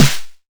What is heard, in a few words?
soft hit clap